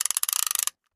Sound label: fish reel slow; fishing reel